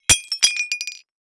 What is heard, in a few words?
Impact Ceramic Bowl Hits And Breaks On Concrete